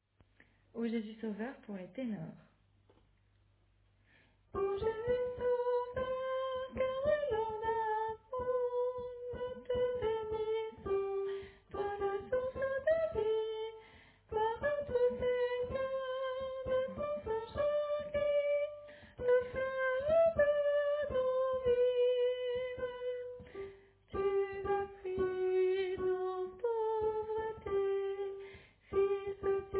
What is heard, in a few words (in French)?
Tenor